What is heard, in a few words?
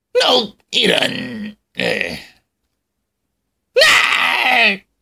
Clown Death, 남자